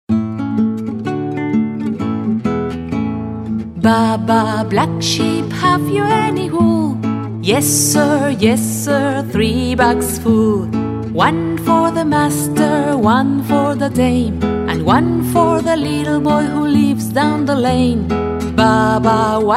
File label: Baa baa black sheep